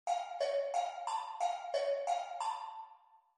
Kuhglocken; unmittelbar aufschlag; Instrumentenkunde

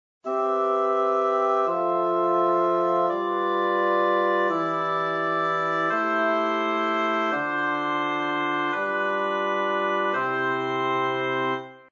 Harm Septakkorde; Harmonielehre

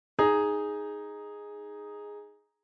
Quarte Dis; Reine Verminderte Uebermaessige Intervalle

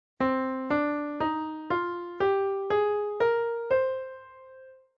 Tonleiter C moll; Weitere Skalen; Tonarten

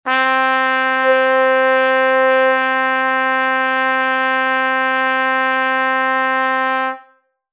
Trompete, obertone horen; Tonsystem

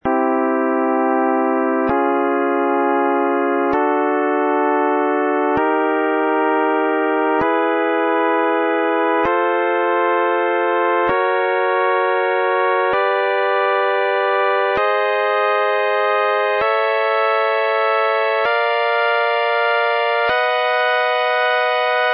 Akk Dur Gleich; gleichstufige stimmung

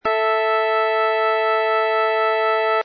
Quinte, Kirnberger Stimmung

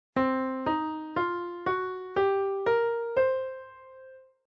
Tonleiter C Blues; Weitere Skalen; Tonarten

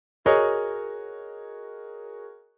Tonleiter Schwarze Tasten; Weitere Skalen; Tonarten

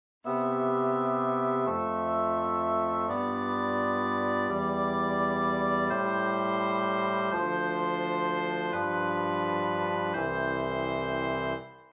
Septakkorde; weiter Lage; Harmonielehre

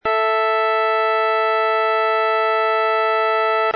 Quinte; Tonsystem

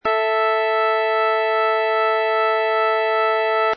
Quinte; werckmeister - stimmung

